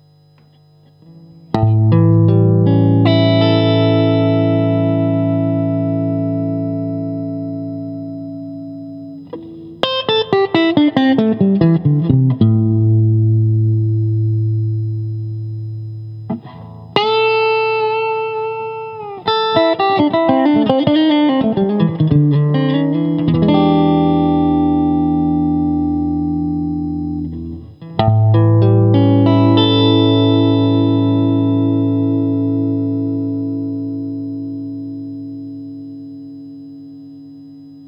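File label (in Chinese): 60S OCC 单晶铜 清音 2档, acoustic guitar; kindman 60s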